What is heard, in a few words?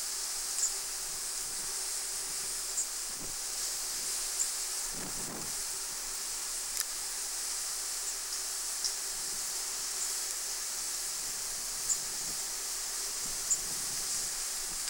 Calls